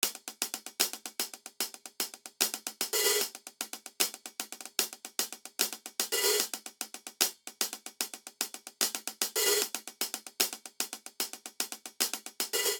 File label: Triplet Hip Hop Hihat Only 75 bpm; 4 4; MIDI Clips; Ableton; Factory Packs; Drum Booth